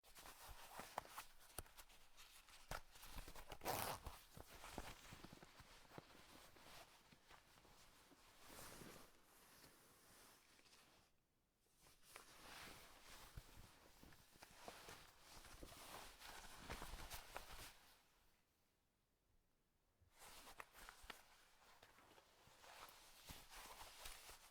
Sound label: zipper